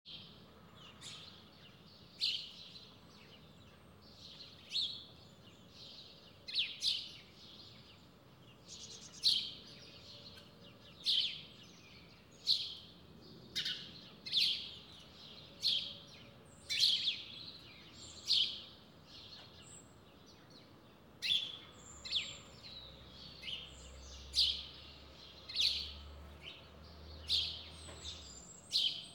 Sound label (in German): sommerliche morgenstimmung mit vogelgezwitscher; geraeusche digital